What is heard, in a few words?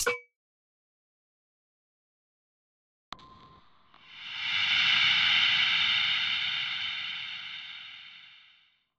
Smoke grenade; Effects